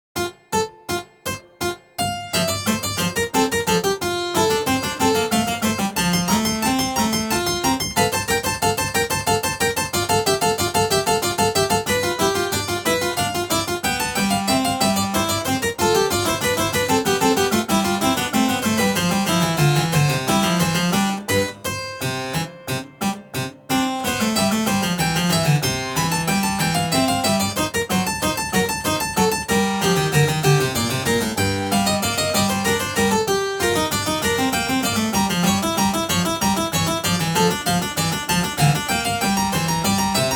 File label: Invention in F Major; Bach; BWV779